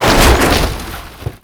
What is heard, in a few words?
suburban crash impact dist; Call of Duty Modern Warfare; physics